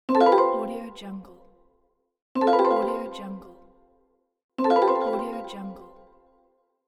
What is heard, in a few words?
bells notification